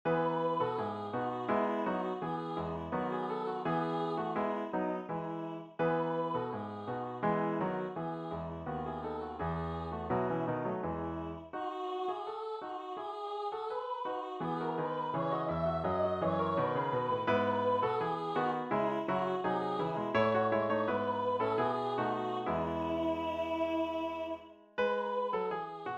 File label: deck the halls